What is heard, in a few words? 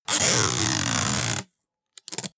sticky tape